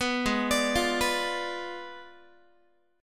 Ab Diminished 7th Ab, Guitar, 4A, 4E, 5A, 5D, 5G sharp, 6C sharp; Abdim7; arpeggiated; 14,16,17,15,15,x